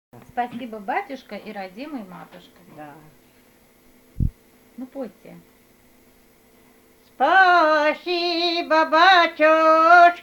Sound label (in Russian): Спасибо батюшка и родимая матушка, Свадебные песни; Псковской области; Объекты нематериального культурного наследия Псковской области